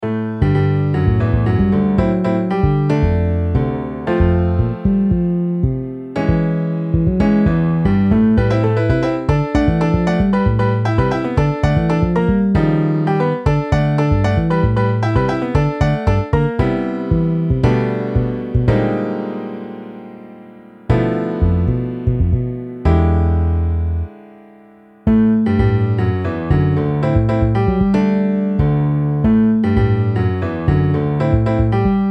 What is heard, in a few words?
Piano Bass